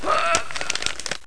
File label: wither; mordor